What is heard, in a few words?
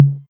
tom mid, Novation Drumstation